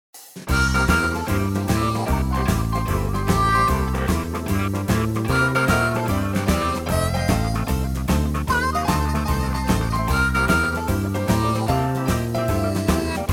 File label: Motown groove